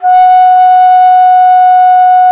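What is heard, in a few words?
TENOR BANSURI; E-MU Producer Series Vol. 7 – Old World Instruments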